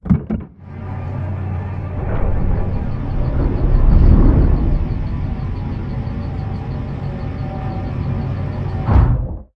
colossus dome opening; avian; apocalypse

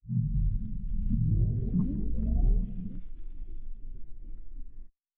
amoeba death; Stellaris; reanimated

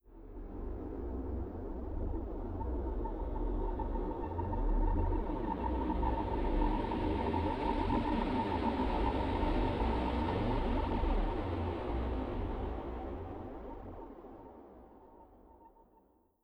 falling star; ambient; Stellaris

